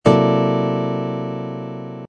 c dominant thirteenth sharp eleventh chord voicing, guitar